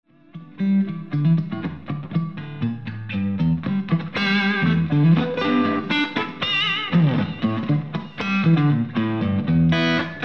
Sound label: SD1 Clean Boost